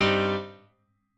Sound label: piano